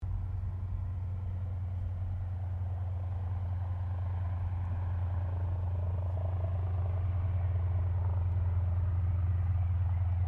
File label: Aircraft Yakovlev Yak-52 Taxi Far, Russian Yak52 Training aircraft